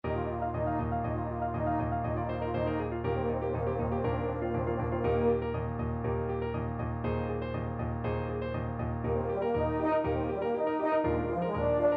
euphonium

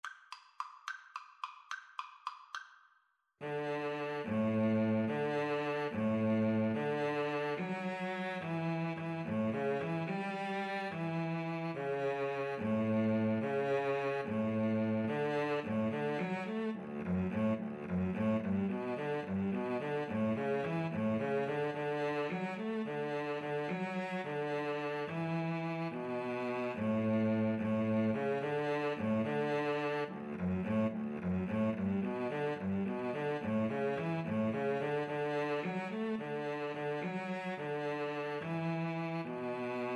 cielito lindo, Violin-Cello Duet

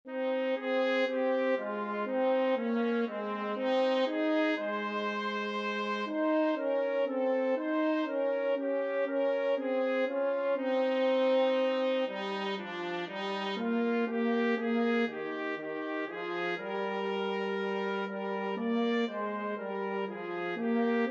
trumpet french horn duet

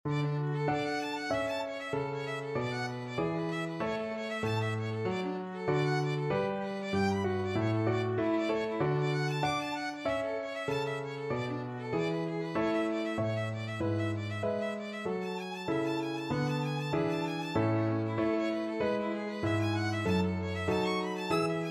allegro violin

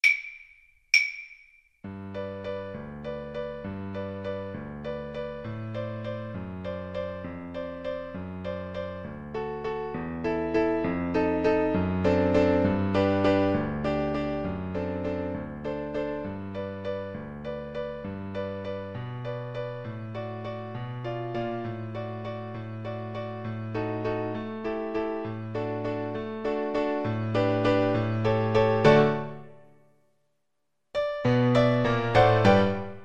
waltz, cello

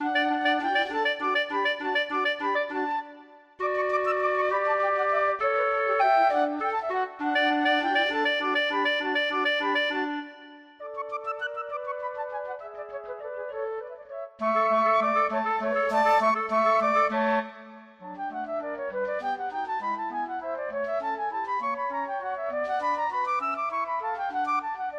minuet, 2 flutes clarinet

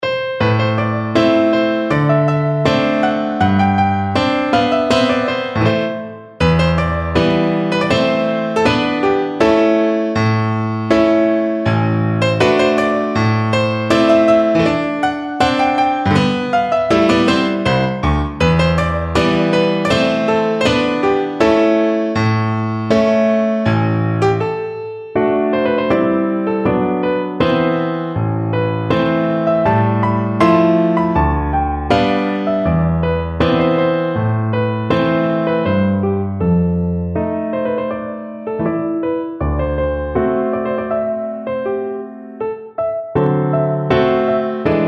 romanian folkdance, piano; bartok

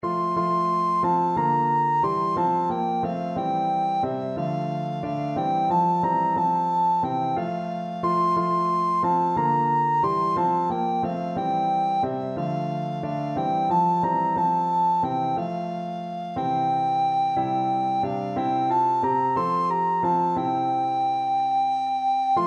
sussex carol, recorder

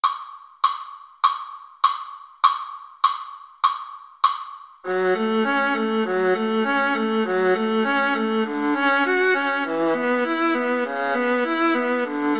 Viola Duet

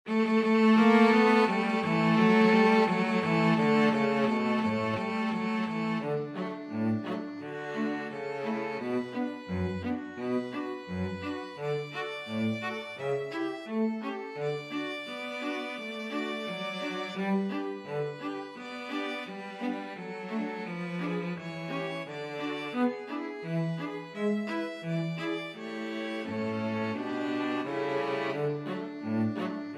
anchors aweigh, string quartet

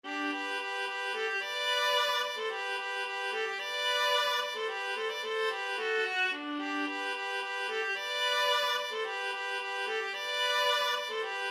spring longer viola duet

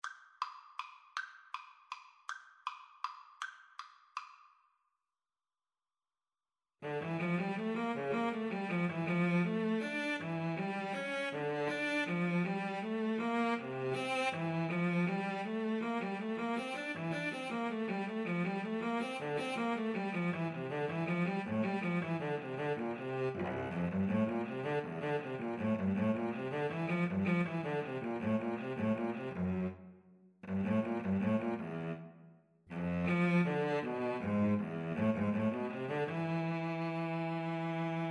bach invention, Violin Cello Duet

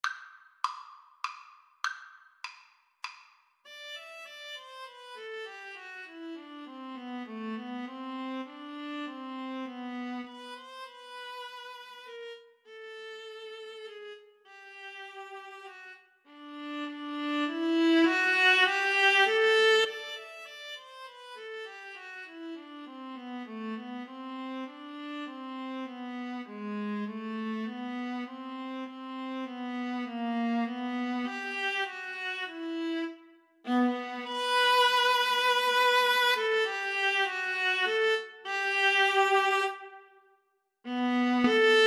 Viola duet

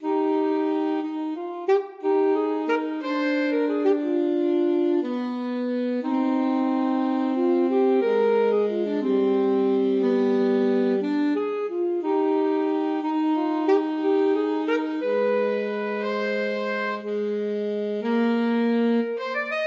duos adagio, alto saxophone duet

